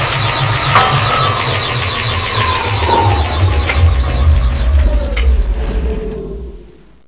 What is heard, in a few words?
gen stop; X-men; ambience